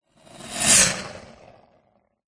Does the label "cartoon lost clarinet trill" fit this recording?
No